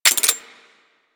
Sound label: Air Pistol Mech; Warframe; Corpus